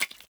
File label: Sword Skin Cut And Stab